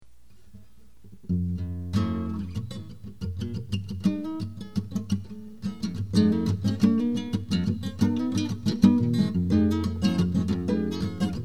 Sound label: guitar